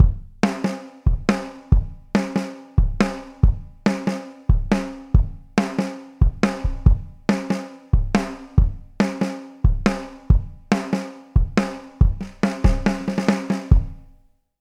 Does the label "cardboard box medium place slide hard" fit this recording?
No